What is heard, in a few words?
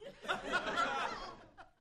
Crowd Short Burst, small int